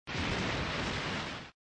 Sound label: Ball Fly